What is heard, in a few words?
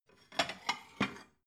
plate cupboard take slide soft